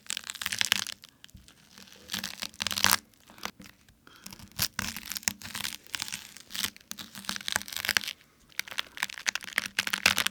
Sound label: Bones And Flesh Tearing